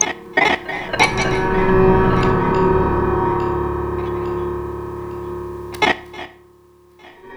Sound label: loop, techno atmos